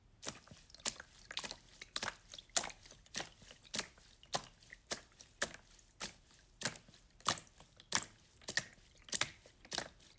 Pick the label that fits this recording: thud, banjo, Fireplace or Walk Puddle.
Walk Puddle